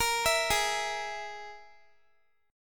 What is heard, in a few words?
G sharp Suspended 2nd Sharp 5th, Guitar; arpeggiated